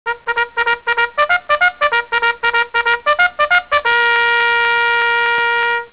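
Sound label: cavalry charge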